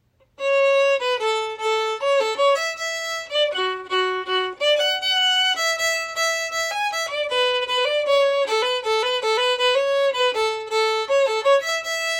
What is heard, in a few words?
harmony